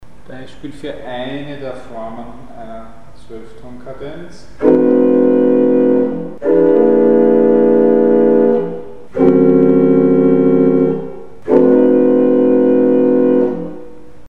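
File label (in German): Eine der Zwölftonkadenzen; Mikrobuch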